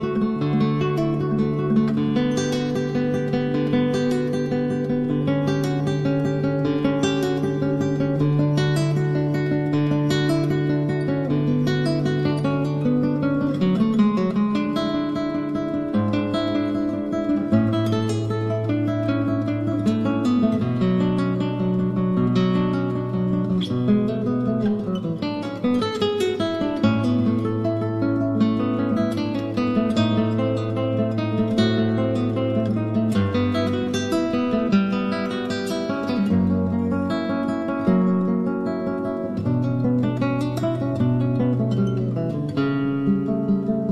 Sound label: Cello Suite No. 1- Prelude